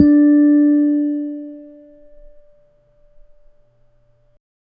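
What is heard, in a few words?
a clean dry audio recording, single note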